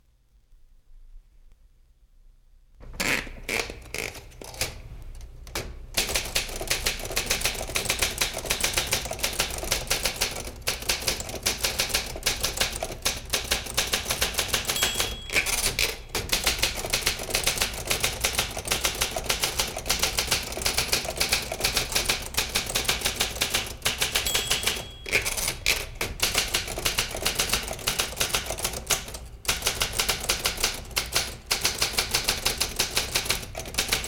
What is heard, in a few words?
Standard Typewriter; Jac Holzman; Authentic Sound Effects Volume 2